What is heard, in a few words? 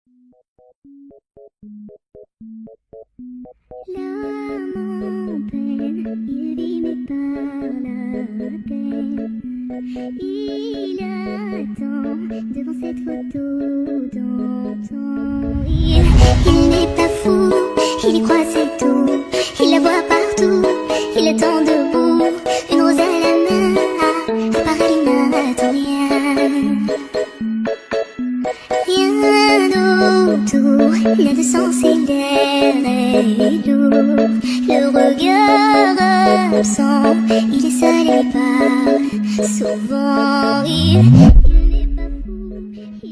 ringtone song